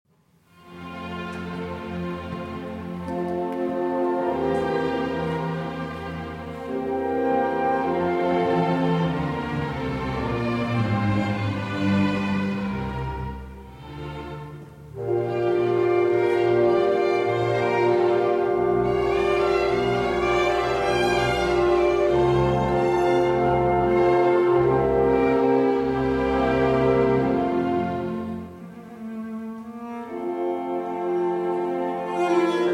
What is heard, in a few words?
Cello Concerto No. 1 in A Major I. Larghetto (Live)